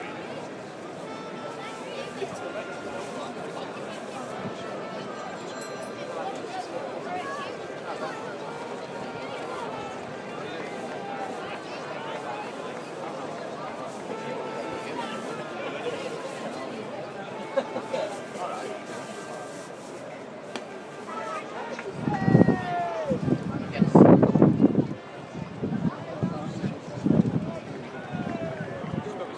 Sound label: trumpet; anyone else hear a trumpet